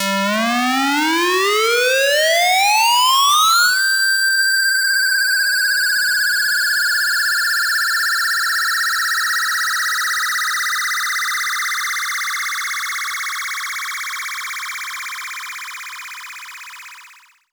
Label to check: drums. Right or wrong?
Wrong